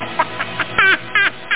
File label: fright laugh; 8,363 sample rate; Amiga 8-bit Sampled Voice